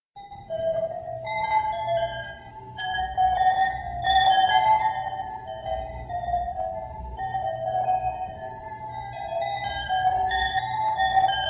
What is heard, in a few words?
electronic construction in glass